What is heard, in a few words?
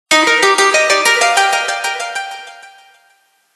Ring Guitar Ascending